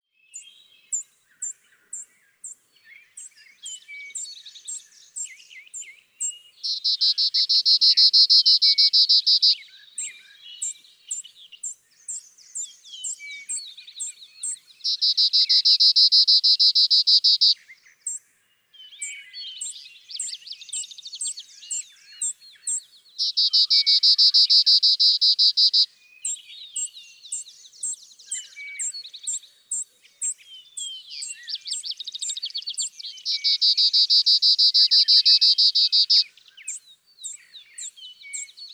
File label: Clay-colored Sparrow